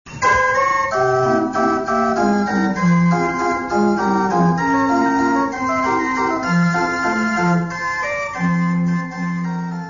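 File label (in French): jingle orgue de barbarie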